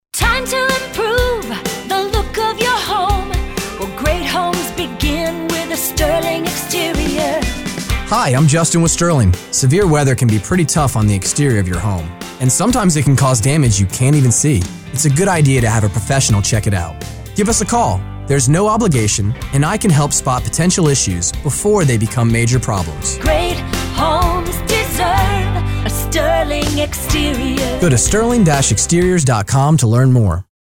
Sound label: jingle; Storm damage